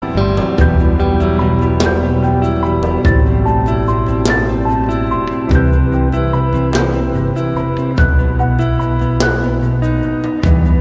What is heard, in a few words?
Piano guitar